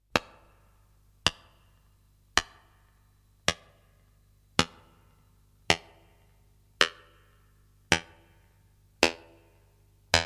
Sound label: Plucked string